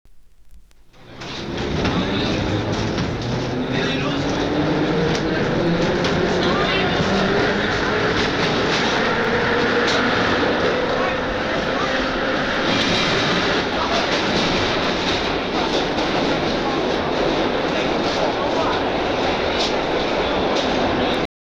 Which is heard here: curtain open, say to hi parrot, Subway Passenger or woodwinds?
Subway Passenger